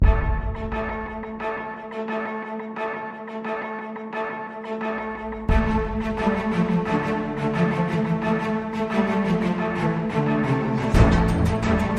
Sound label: No Choir